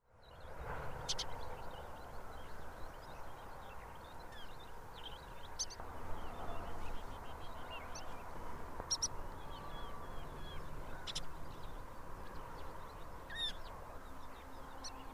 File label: Rufous necked Snowfinch Pyrgilauda ruficollis, rufous necked snowfinch call; Bird Call